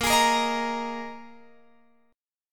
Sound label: A sharp 7th Suspended 4th A sharp, Guitar, Open F; A#7sus4; strummed